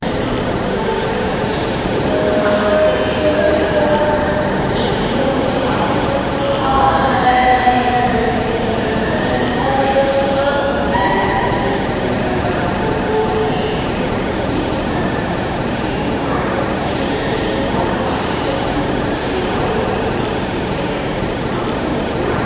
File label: background sound of an, hospital